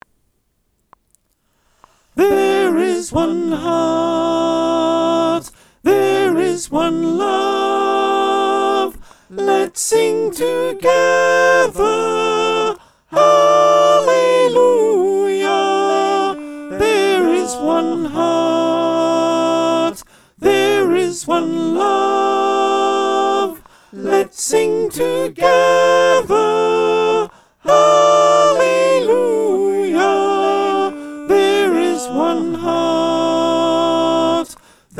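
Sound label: soprano, choir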